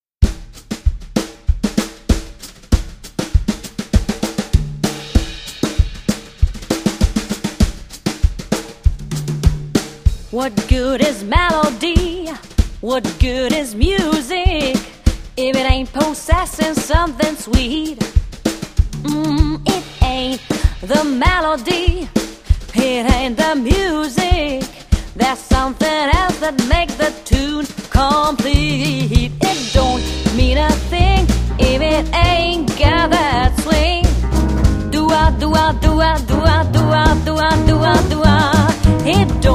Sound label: Pop Jazz